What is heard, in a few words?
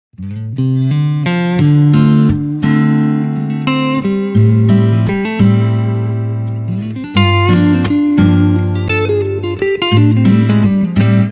Ringtone